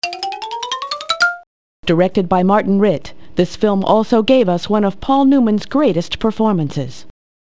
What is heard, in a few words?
xyla; quiz